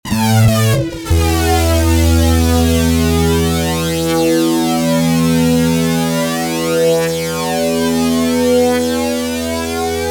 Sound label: fuzz gtr polyflange